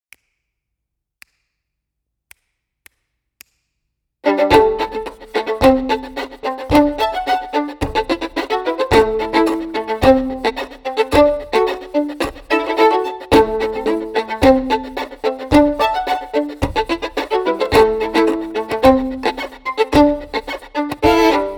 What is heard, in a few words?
fiddler train your chops